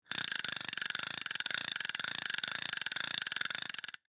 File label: Fishing rod reel slow